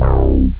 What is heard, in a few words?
Wow Bass